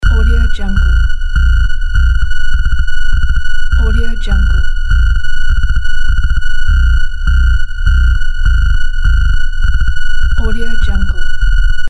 data loading loop

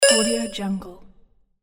puzzle sound

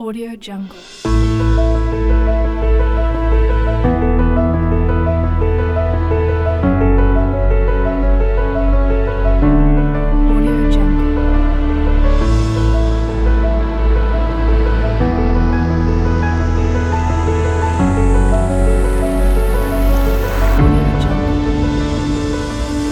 piano inspiring

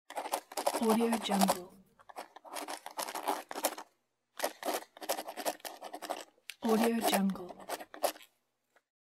writing pen

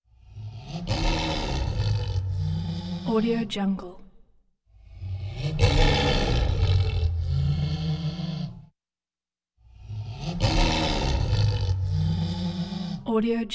lion roar inhale exhale breath wheeze; prosoundfx

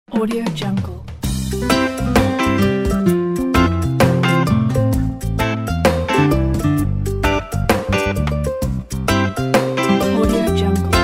tropical beauty

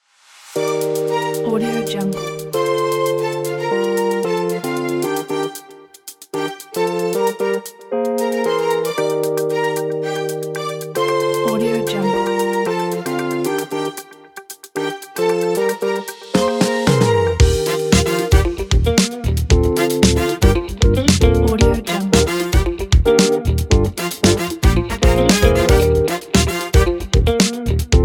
the funk

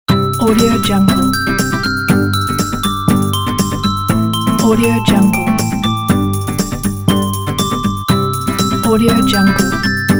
acoustic intro ident

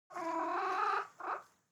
animal chicken hens crying

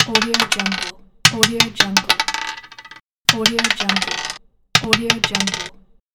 dice

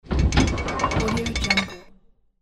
mechanical hatch open steampunk

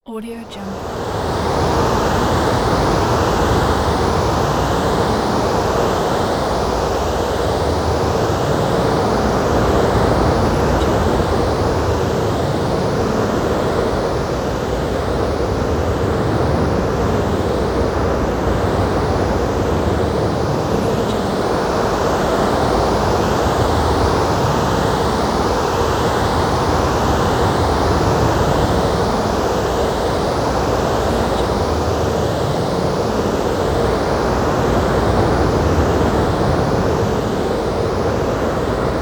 a forest wind

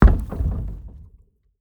impacts source metal wood farm door hit; robotic lifeforms 2

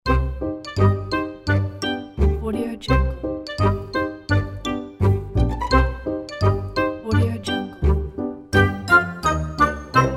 cute kids musical interlude; applehillstudios

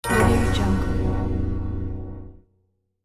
low charm accent; sound ideas

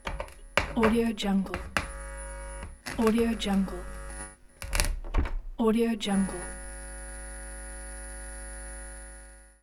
turning on off guitar amp; albasfx

